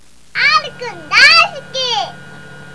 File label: chime; Kyukyoku Chojin R; 娘の生声